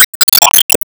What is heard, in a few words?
Megaflare Landing; Se Sp Megaflare Landing